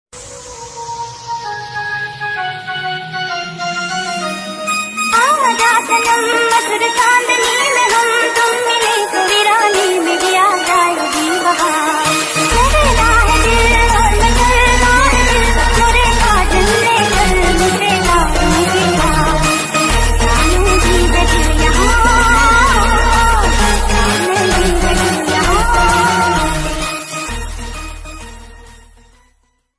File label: garba dandiya navratri special song, ringtone